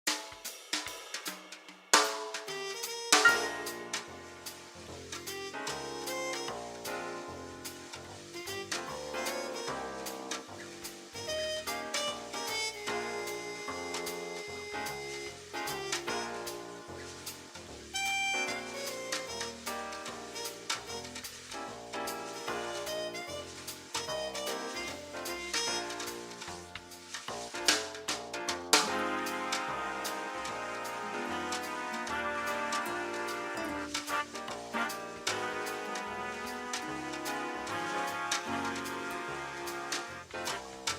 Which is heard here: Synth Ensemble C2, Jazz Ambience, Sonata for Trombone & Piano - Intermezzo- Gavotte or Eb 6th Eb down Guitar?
Jazz Ambience